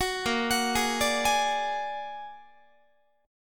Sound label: A sharp Augmented 7th A sharp Guitar; arpeggiated; tuning 4A,4E,5A,5D,5G#,6C#